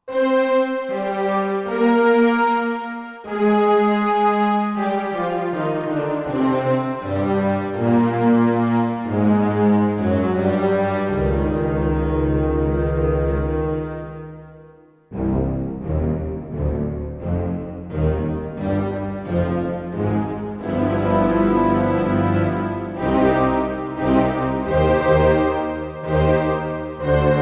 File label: Orch Ens